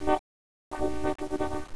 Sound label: vocoder